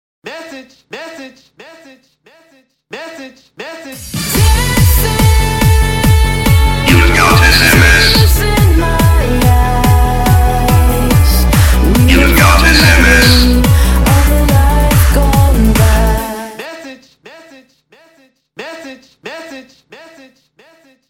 Dancing Msg

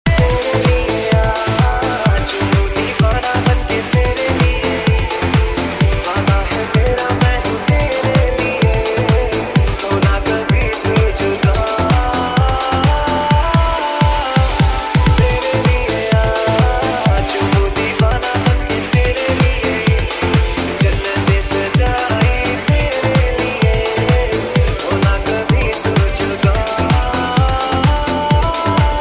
Sad Remix

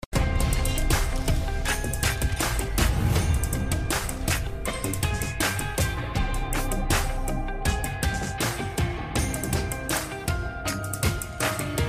Springy; Fortnite

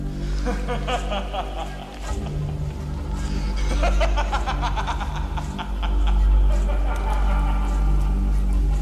The Crow Laughs

